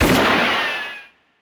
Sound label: win shot; Wild Guns